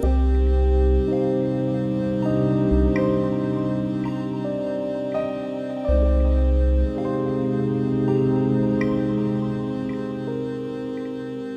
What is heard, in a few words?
Circular Breathing, Pads